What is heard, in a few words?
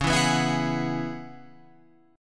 Db Minor 11th Db Guitar Open F; Dbm11 strummed; JGuitar